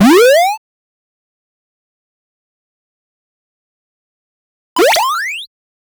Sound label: retro 8 bit platformer ju